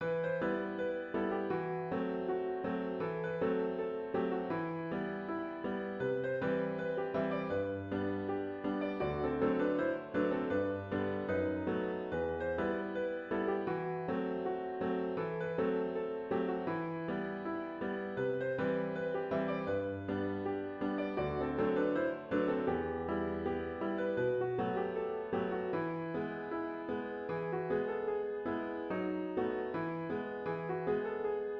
pour piano

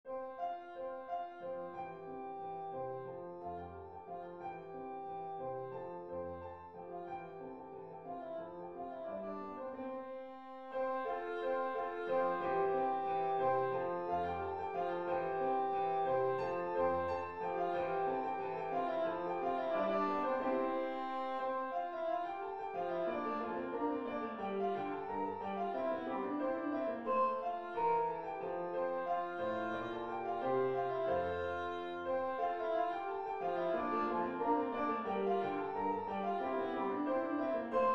gavotte majeur; corelli arcangelo

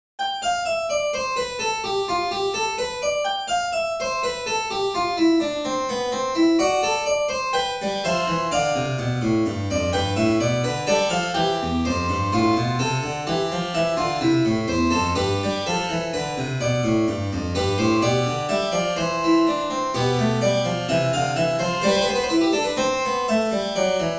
invention en do majeur; Chopin

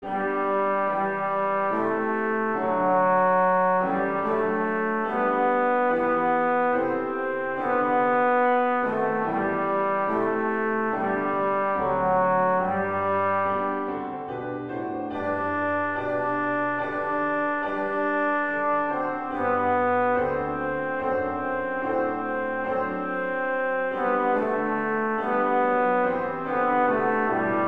hymne national anglais

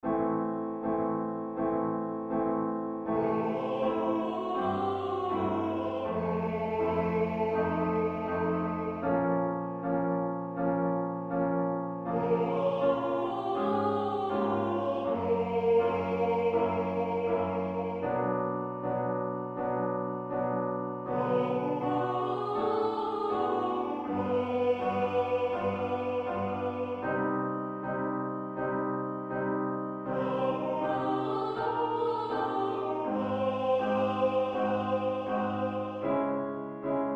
mise en voix echauffement vocal exercice vocal vocalises

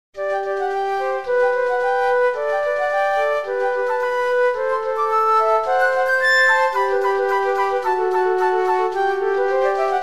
duo pour flutes; vignon denys

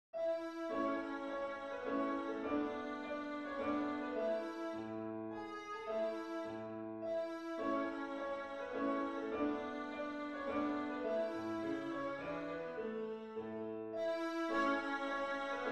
danse allemande, la majeur

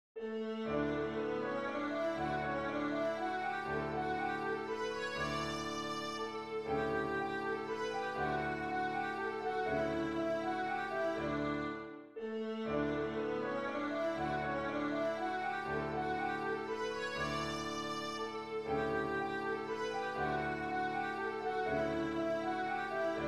danse allemande; beethoven ludwig van

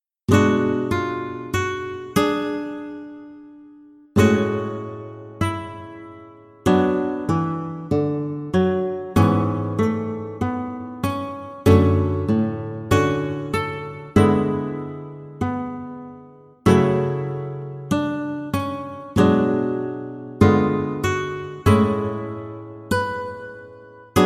harmonie sur gamme

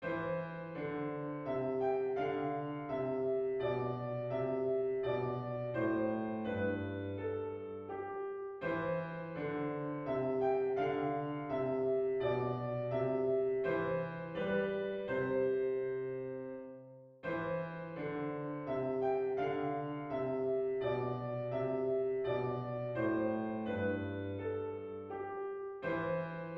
menuet majeur; bach johann christian

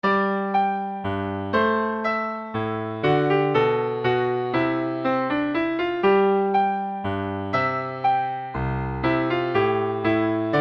menuet sol

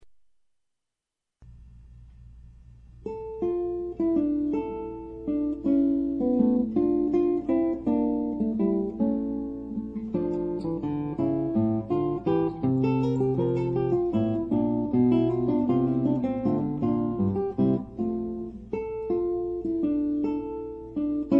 gigue; visee robert de

